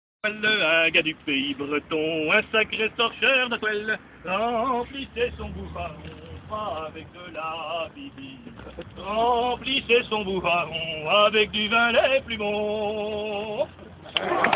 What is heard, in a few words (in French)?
chant